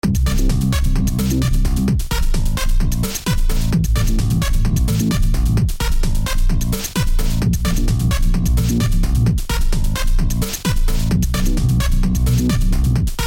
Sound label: alien fight